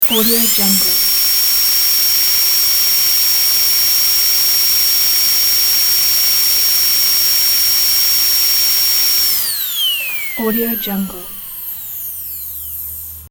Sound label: dentist drill turning on and off